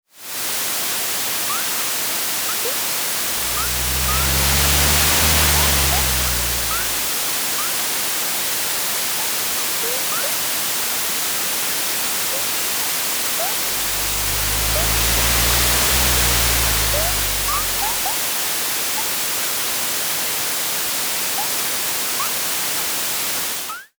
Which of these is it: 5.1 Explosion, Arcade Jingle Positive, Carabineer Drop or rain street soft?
rain street soft